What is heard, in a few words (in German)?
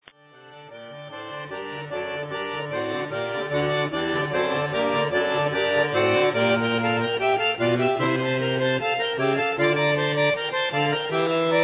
solo fuge